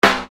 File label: Snare Dr